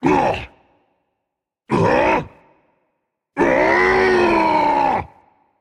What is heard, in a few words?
fear rage; chaac